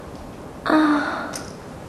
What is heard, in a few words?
ah soft